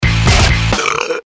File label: Belch Pellet; Best Of N2O Library; Breakers & Transitioners